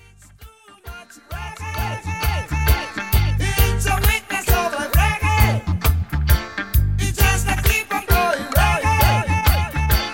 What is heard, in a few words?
Reggae